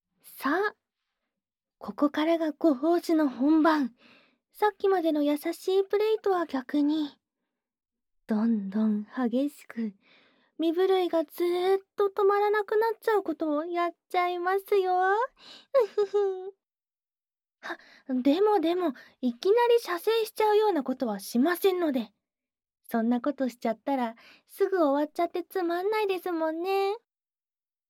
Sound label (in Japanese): 耳舐め乳首責め（右耳）; 乳首で感じるロリコンだー！; 耳かきリフレ; 天才メスガキ